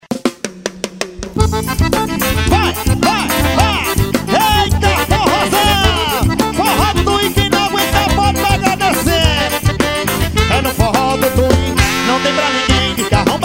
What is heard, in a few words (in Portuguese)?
forro; castrinho cds